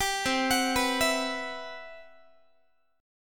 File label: C Major Sharp 11th, C, down, Guitar; CM#11 arpeggiated